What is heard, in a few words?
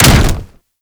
Grineer Manic Charge Slam